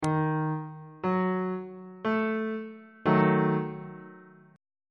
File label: d sharp diminished chord on bass clef